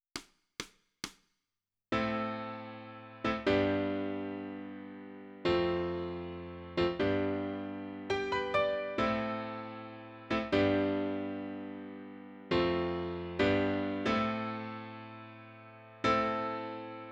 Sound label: Piano